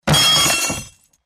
Thud Fist On Table, clay ceramic

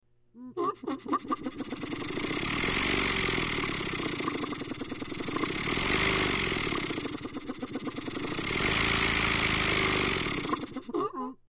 Motor Pump Small, pumps

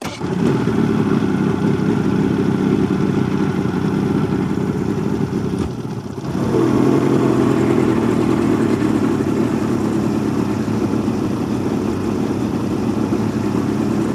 150 hp Mercury Boat Away Loop Start, Drive

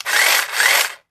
Hedge Trimmer: Small Motor Start And Steady.; lawn mower

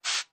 Hairspray, Aerosol & Pump; aerosol can

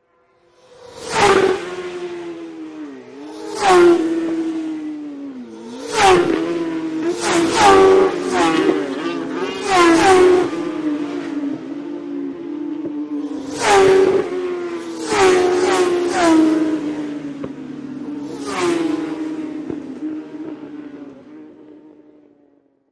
Motorcycle Road Racing

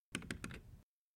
Alarm Keypad Entry; hi-tech-various

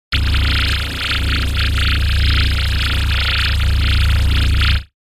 Laser Warbled Beam

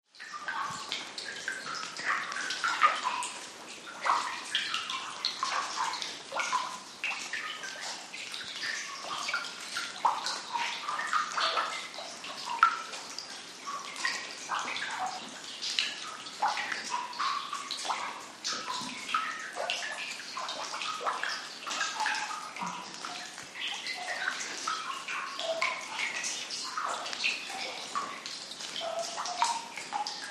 Cave Drip Echoey; drips

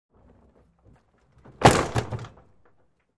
Metal Trailer Door, trailer

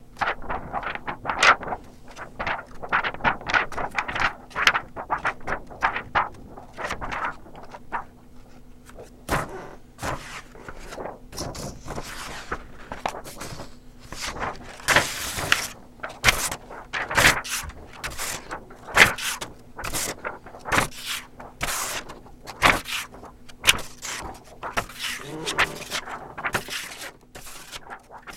Paper Wavering Shuf; paper mvmt

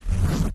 zipper; zippers

